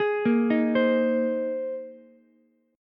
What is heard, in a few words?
Bb 9th Suspended 4th Bb down Ukulele C6; arpeggiated; JGuitar